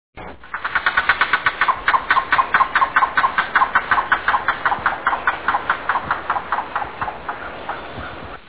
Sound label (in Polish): kukawik żółtodzioby - Coccyzus americanus; Kukułka wschodnia